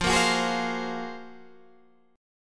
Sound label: F sharp Major Sharp 11th F